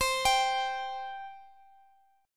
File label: C 5th, C, down, Guitar, Half Step Down